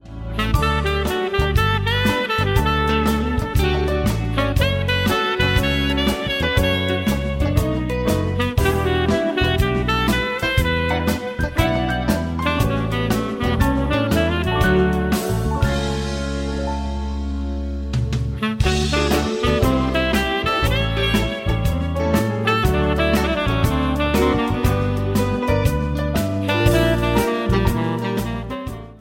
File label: saxophon ringtone; android